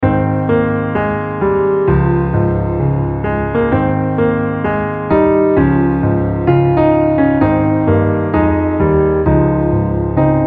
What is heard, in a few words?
Underscore PIANO; Worship Flow